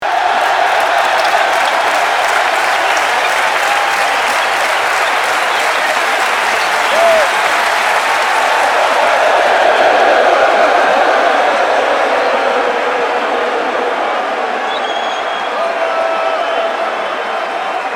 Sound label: crowd cheering